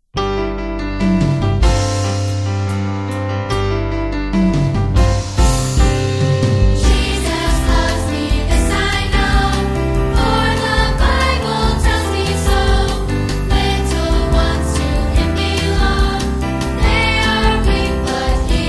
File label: Vocal; Jesus Loves Me